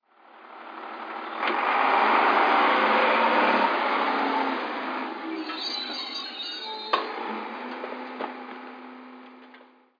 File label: garbage truck; ambient